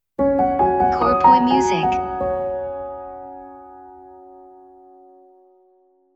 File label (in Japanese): jingle ウォーター